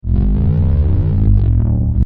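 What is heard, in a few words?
AMB LOOP, Sci Fi Atmospheres; Partners In Rhyme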